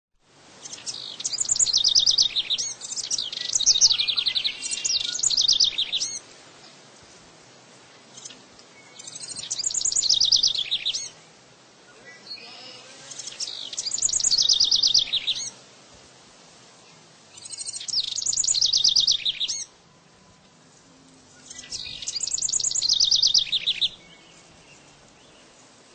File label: House Wren; Birds